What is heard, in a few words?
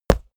step walk stone; schritte